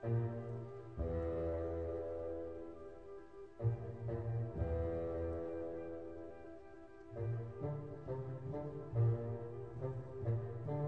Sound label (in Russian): fagot